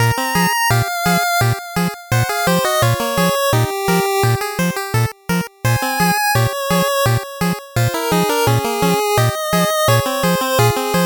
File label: 8bit bgm normal